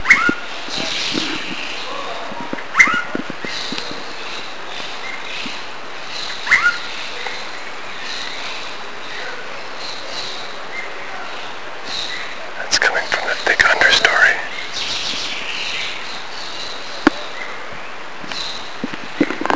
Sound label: Wren, Long-billed, Brazil Birds; Brazil 2005